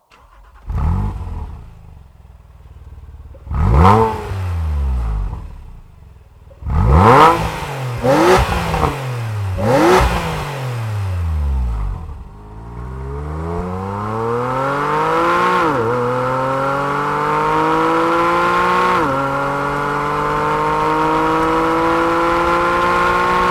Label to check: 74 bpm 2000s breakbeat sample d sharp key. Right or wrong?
Wrong